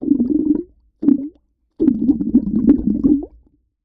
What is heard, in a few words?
underwater bubbles; fienup